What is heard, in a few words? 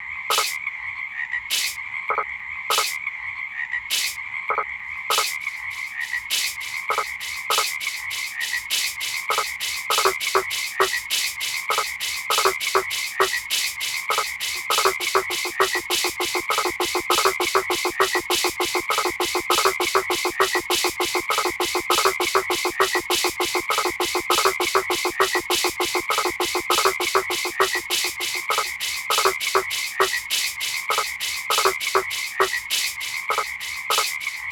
Boana faber